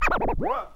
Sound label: SCRATCHES